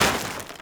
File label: Trench Netting Destroy; Gameplay; Warframe; Tau; Destructible Dynamic